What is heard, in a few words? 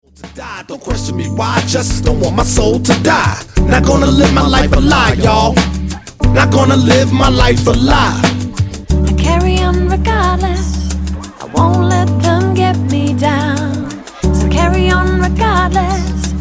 HipHop